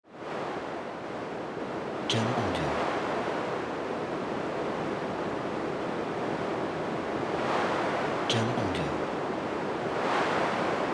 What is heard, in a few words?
Strong Wind